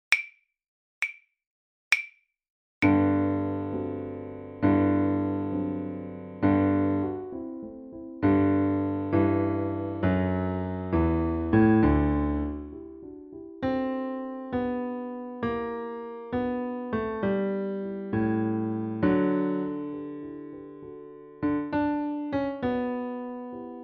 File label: Etude accomp, level2violin; The Violin Fun Book (Level 2); Etude #5